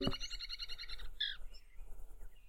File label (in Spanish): Burlisto Pico Negro